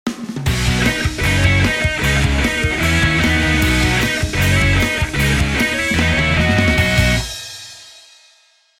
Kickflip Short, jingles; iLife Sound Effects

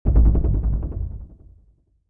Electric Flutters, Stingers; iLife Sound Effects

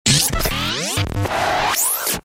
Electro Static Accent; iLife Sound Effects

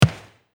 Soccer Kick; iMovie Sound Effects

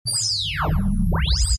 Warp Engineering, Sci-Fi; iLife Sound Effects

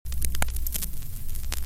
Record Player Static; Machines; iLife Sound Effects

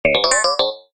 Computer Data, Sci-Fi; iLife Sound Effects

